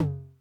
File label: Tom Hi Accent Mid Tone Max Decay, 909